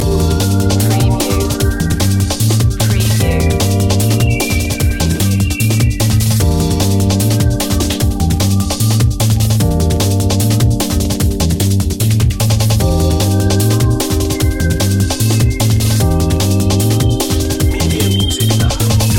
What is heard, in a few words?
xpress loop